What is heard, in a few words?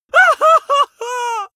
male really afraid whimper; vocals; comedy-cartoon; soundpool